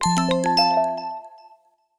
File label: Lock Chime WIN